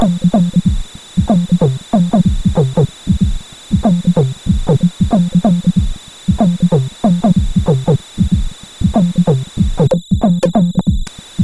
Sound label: Snakepit end, CSG8580R5